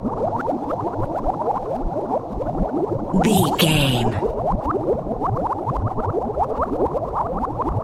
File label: potion bubbles brewing loop